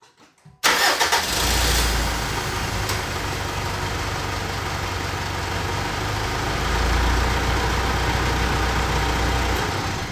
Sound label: Truck Engine